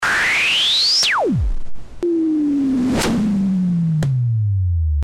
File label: noise osc